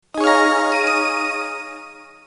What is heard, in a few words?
alarm